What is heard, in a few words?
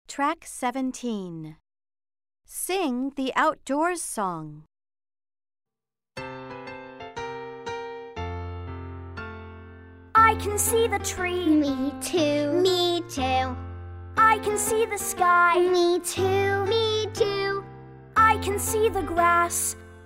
the outdoor song